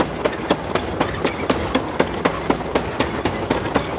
treads tractor; bt7; World of Tanks Blitz

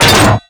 hydrant body impact; Explosions; Call of Duty Modern Warfare

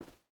footstep hide carpet walk; Rust

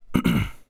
idle clear throat

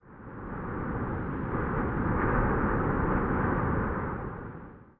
ambient battle; World Of Warships

